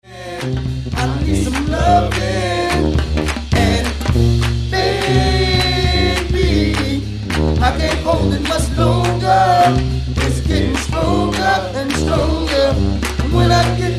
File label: brass band club re edit